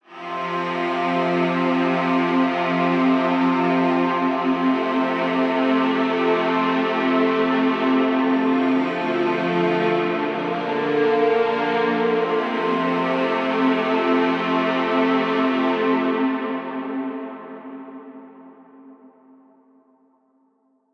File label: Strings 123 Fmaj Tail